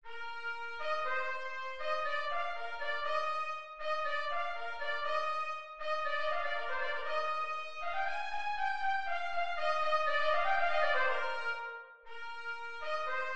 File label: Trumpet Track